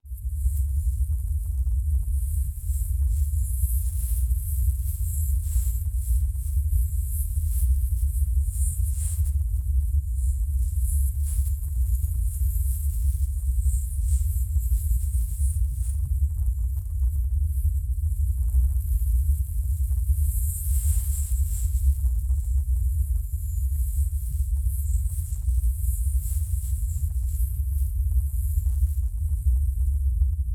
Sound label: Electrical Arc Buzzing Atmosphere - Deep Rumble Drone With Buzzing Sparks; Hollywood Edge; sound designer's toolkit v2